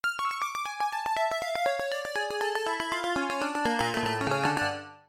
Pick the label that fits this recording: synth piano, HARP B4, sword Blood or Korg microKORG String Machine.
synth piano